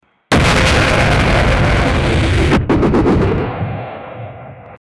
war declaration; hoi4 like; army

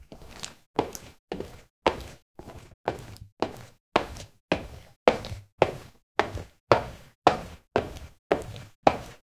male leather dress shoes

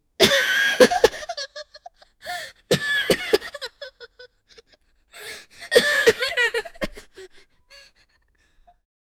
concerned gasp from kids; worry

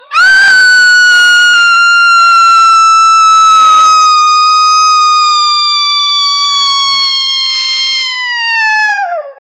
woman starts to scream, fear

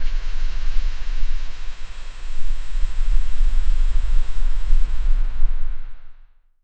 bone crack cinematic

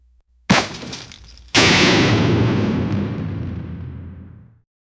deep heavy whoosh of a; destroyed

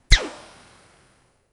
create a short soft squis, funny

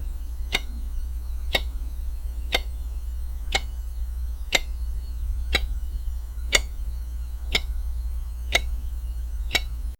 small room ambience

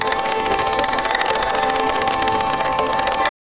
casino, sound-effects

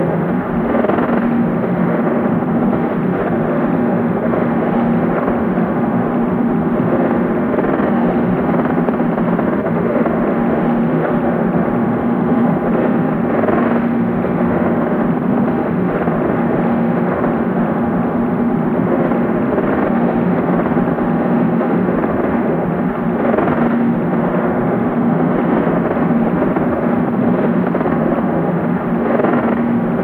looping shooting, urban environment

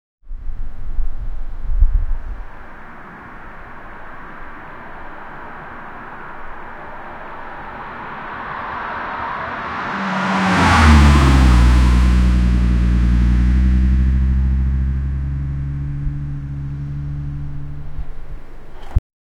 car cranking over

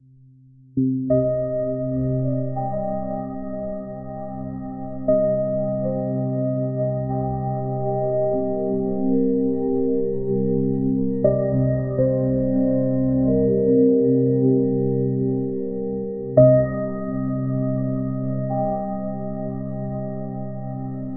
style titl, smooth end

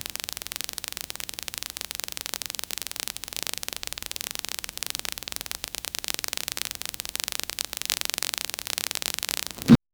spider bone joint cracks

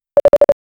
sound to play when user, activate

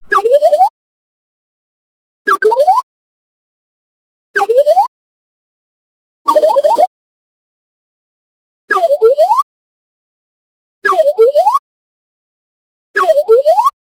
the cars sound; animation

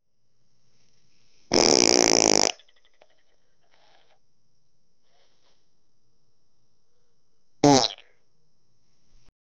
farts sound; hitch trailblazer

